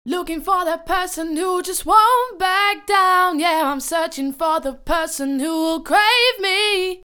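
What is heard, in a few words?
120 B, dance vocals; crave me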